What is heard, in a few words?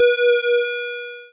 turnoff, Retro Sounds 32-Bit